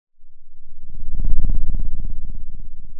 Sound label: pop